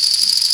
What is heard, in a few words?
RATTLE, JAPANESE DRUM; AKAI S6000 CD-ROM - Volume 1; PERCUSSION